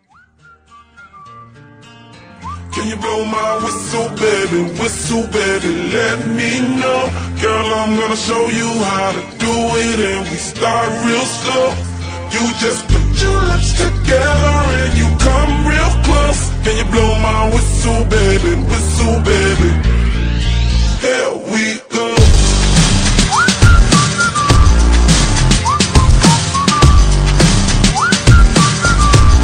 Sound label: Whistle Remix